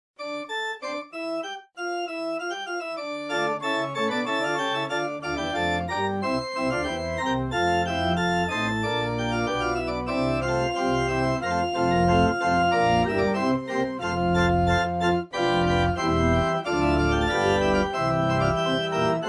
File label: 16 8 4 2 dry, organ